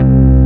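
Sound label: analog organ C2, analog synth; AKAI S6000 CD-ROM - Volume 1; SAMPLE WAVE MIXING 1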